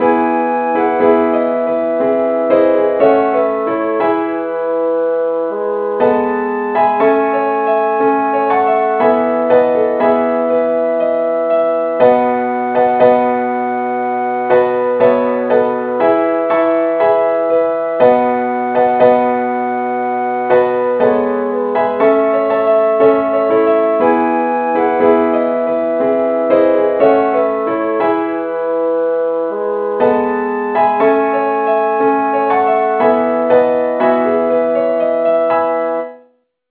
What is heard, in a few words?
keyboard key A